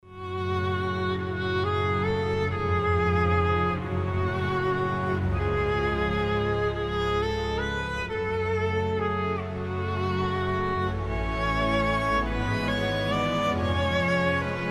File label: Jingle; Effata nyilj meg